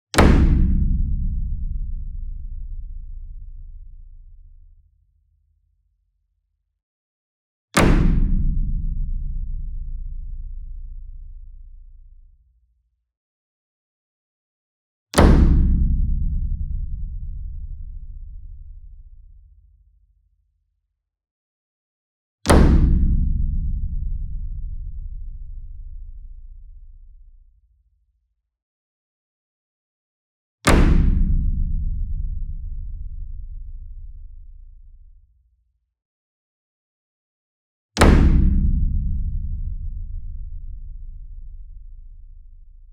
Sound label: SINGLE DRUM Gong Flam Hard Beater Near; boom library; Cinematic Strikes Construction Kit